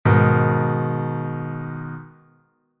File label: G♯m, Chord Inversions